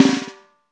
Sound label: LOFI, snares; Spectrasonics; Burning Grooves